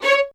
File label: LDVLN1 ATT C, LOUD VIOLINS; Q Up Arts; Denny Jaeger Master Violin Library